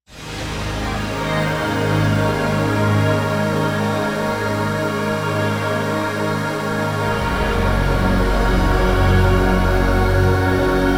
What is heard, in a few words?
XENOVIA PAD, synth